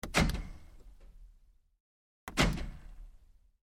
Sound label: Truck GMC Savana Switch Auto Locks Roomy; 2010 GMC Savana; Trucks